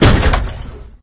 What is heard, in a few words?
Vehicles Generic